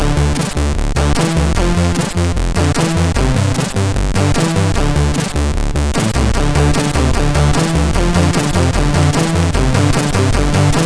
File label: Mayhem Intro, CSG8580R5